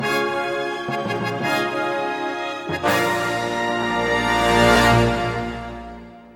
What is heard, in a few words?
fanfare